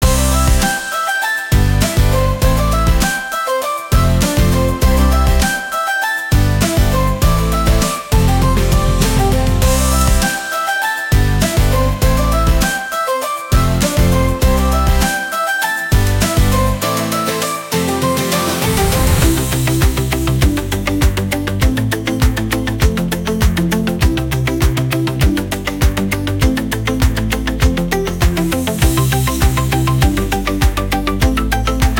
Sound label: Happy Balloon Party, instrumental; ai social media music